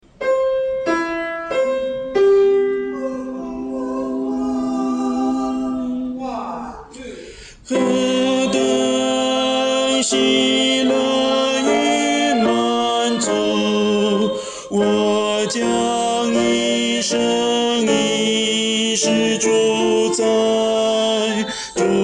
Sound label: tenor